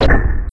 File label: switch; zelda